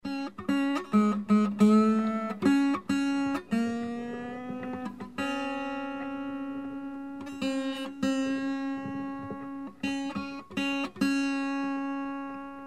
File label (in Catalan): guitarra